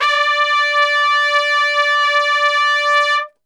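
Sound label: D 3 trumpet straight, Brass; Best Service; Brass Super Section; TRP STRGHT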